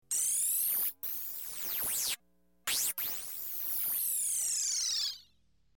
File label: Constant Beeping Vid, beeps & tones